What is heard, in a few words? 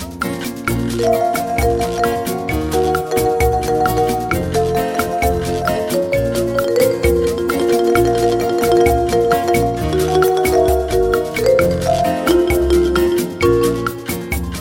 Guitar Bossa Nova